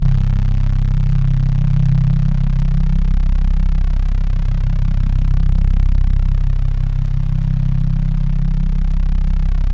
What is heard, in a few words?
C1, Synth Leads